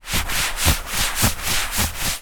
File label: foley hand rub leather sofa arm rest